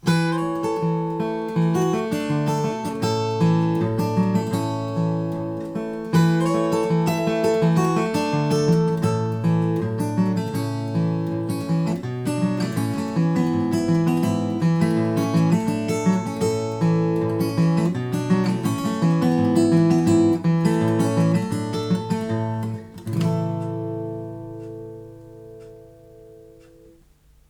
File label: fingerpicking; Lefthand 000-12fret; deerbridge guitars; Martin